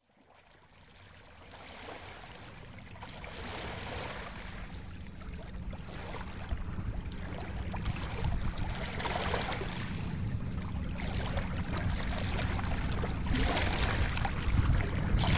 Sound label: waves